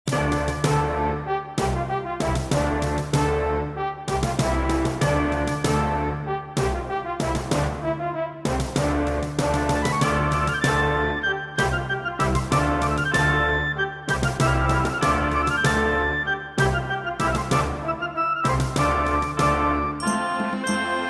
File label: Midi; bucsden